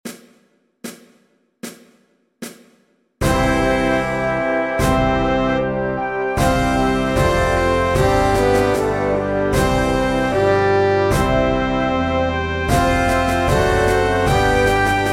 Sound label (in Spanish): Flauta Travesera y Flauta Dulce; Himno de Espana; en do mayor